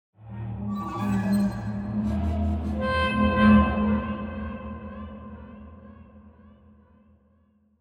Horror Ident Stinger Tune; RustsDusts

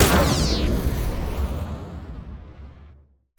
Launch Fire Grenade Missile

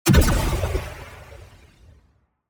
Bio Fusion Blast Long Tail

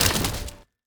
Wooden Cracks

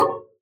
Gadget Click Button

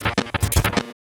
Digital Glitch Malfunction

